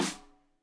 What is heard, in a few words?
Rim Shot Stereo; Deeply Sampled Drums; SMDrum